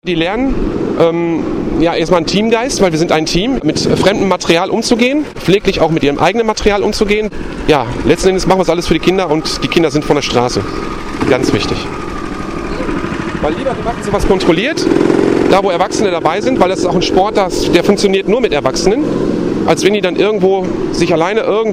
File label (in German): motor